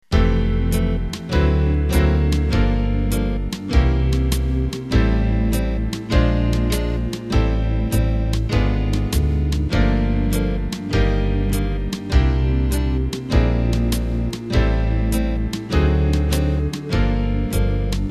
Slow Jazz; #s jzz 2.sty

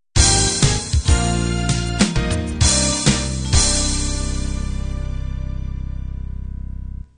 home beat end

